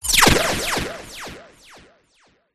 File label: frequency modulation short sharp laser style hit with delay; sound design